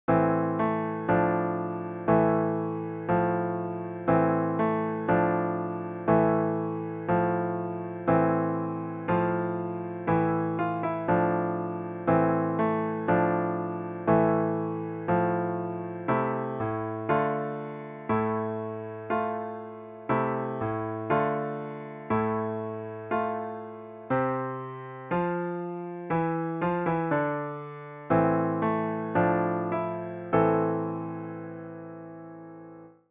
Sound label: volga boatman piano solo